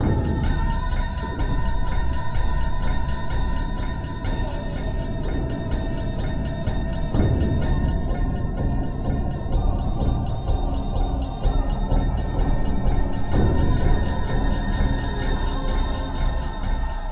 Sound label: sounds music; Halo Combat Evolved; Xbox